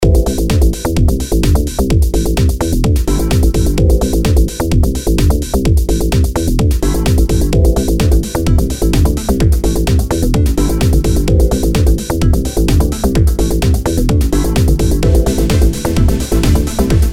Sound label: simple bgm loop